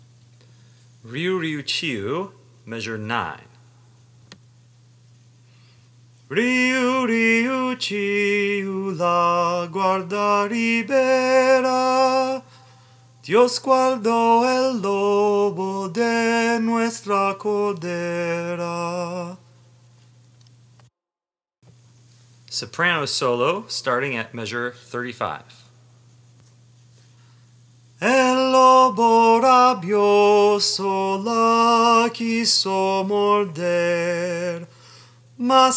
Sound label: Soprano, voices; Riu Riu Chiu; pronunciation tracks